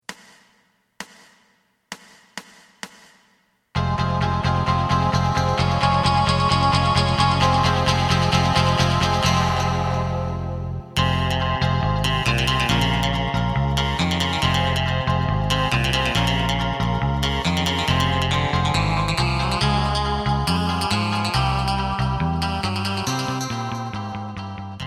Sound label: drum